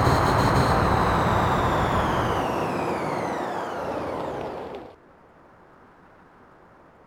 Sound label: throttle off, vehicles